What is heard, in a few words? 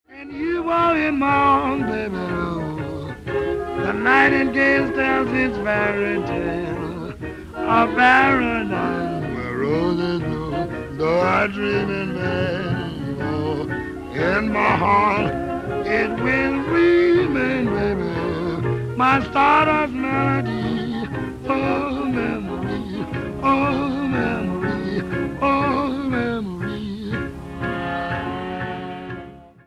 Jazz; Louis Armstrong House Museum